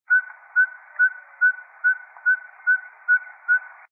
chevechette du colima glaucidium palmarum

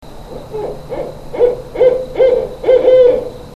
rayée, chouettes

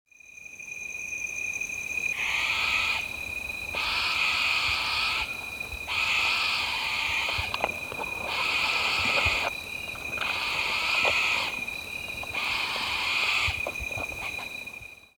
effraie des Célèbes tyto rosenbergii

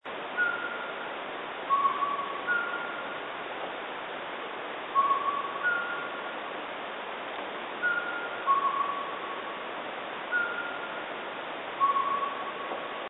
chevêchette des rocheuses glaucidium californicum couple

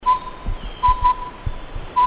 chevechette a collier glaucidium brodiei cri diurne

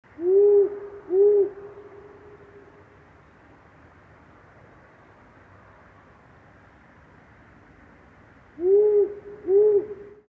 ninoxe rousse mâle

